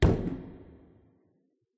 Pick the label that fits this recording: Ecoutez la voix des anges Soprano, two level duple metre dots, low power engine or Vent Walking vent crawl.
Vent Walking vent crawl